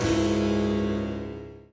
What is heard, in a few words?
harpsicord; Notes